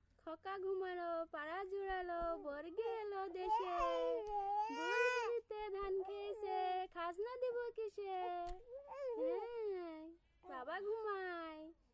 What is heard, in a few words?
lullaby; women led climate justice; impact of poverty